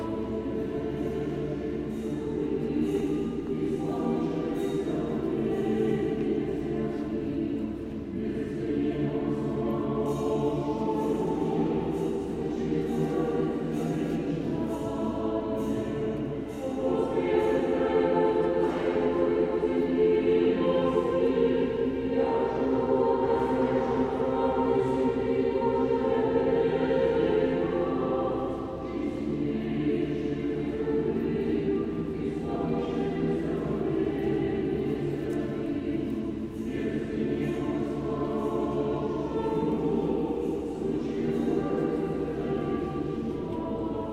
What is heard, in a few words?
Churches Service At Cathedral; Peter And Paul Cathedral; baileywiki maps premium towns